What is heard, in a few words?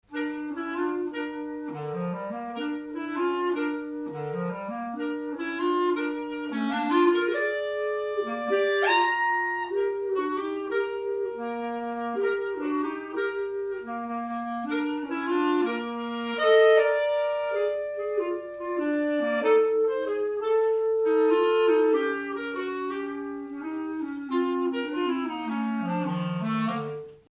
Blues